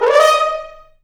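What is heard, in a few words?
french horns rips; Roland L-CD702; F.Horns FX+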